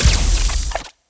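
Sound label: Short Laser gun Electron Impeller Fire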